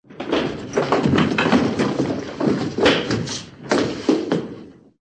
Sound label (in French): tomber